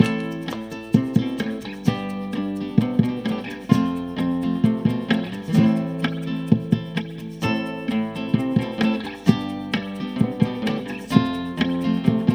wstep

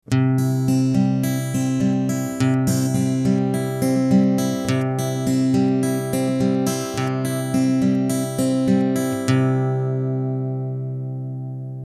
pasaż 4/4 C dur, gitara

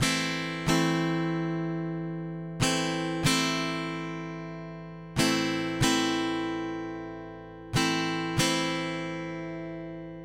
bicie 4 4 2 uderzenia

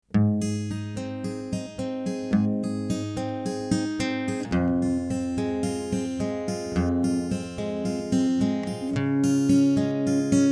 pasaż 4/4 As-dur

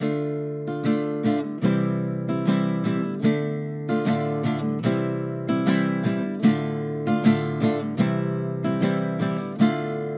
reggae bicie bazowe, gitara

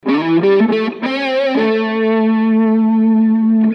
git. elektr. pentatonika a-moll zagrywka

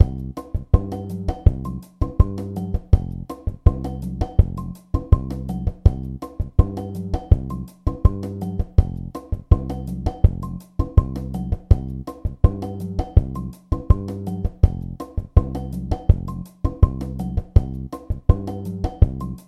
improwizacja samba Cm7 Fm7, gitara

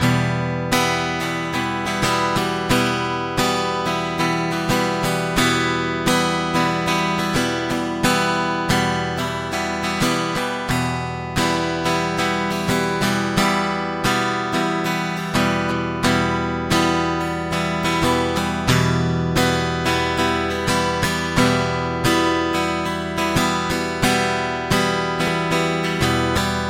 bicie e moll, gitara

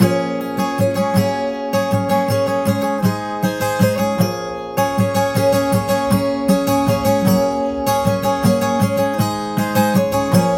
bicie refren, gitara

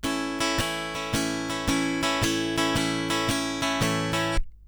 A-dur bicie swingujace; Lekcja2.7

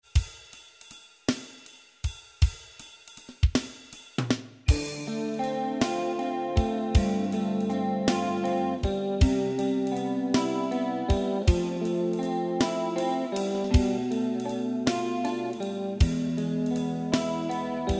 pasaz, gitara

